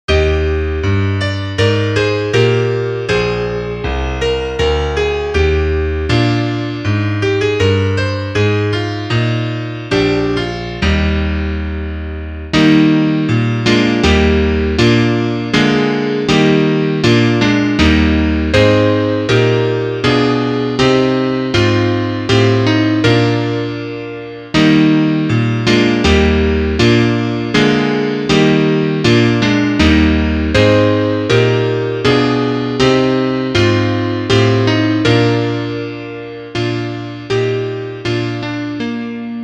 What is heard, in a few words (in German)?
Klavier; Liederbuch; einigkeit und recht und freiheit